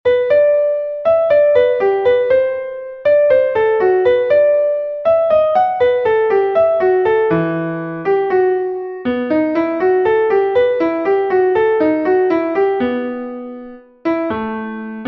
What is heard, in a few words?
Short melody, guitar